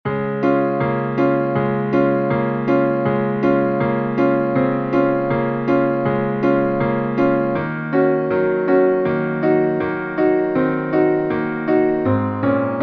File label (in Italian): sassofono natale jingle bells Piano